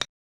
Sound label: Putter Shot; Okamoto Ayako to Match Play Golf; SNES